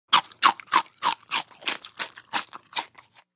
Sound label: Bite Celery Chew